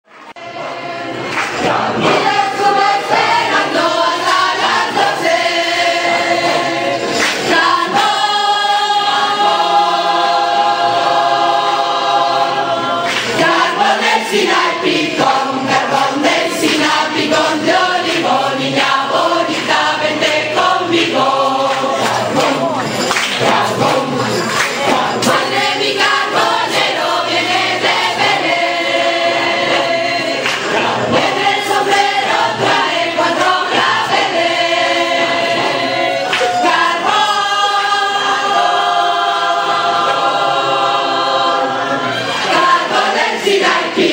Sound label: coro